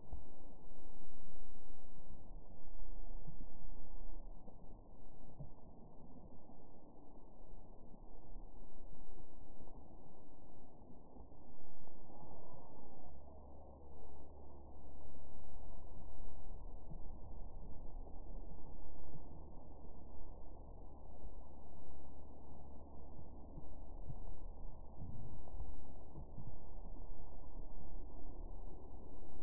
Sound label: humpback